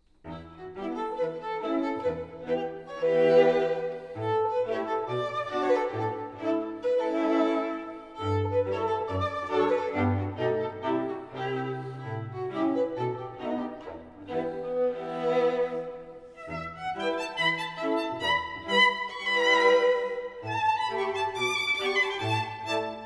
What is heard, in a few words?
String Quartet